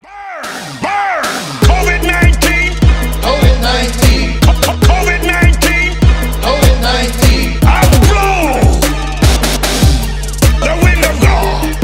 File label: remix